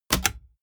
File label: click